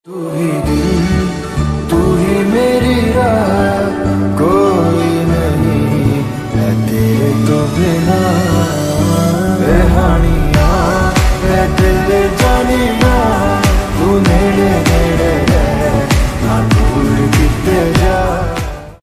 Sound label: ringtone new hindi ringtone bgm instrumenta song ringtone